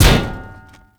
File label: Metal Debris Impact; Gameplay; Warframe; Tau